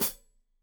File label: Hat Foot, Hi-Hat, Cymbals Stereo